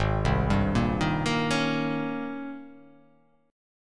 Ab Major 11th Ab; AbM11 arpeggiated; JGuitar

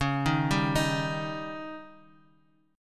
Db Suspended 2nd Flat 5th

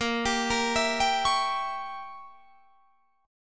A sharp Diminished 7th Guitar Drop D

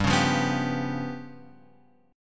G 7th Flat 5th Guitar Open F, G7b5 chord; strummed

